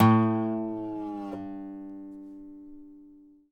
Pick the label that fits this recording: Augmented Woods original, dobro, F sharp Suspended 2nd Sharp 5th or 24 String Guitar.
dobro